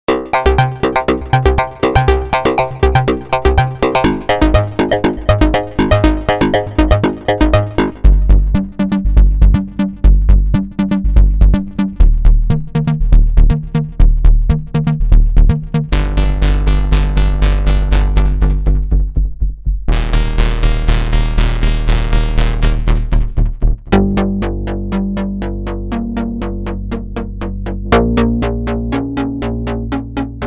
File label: pulse arp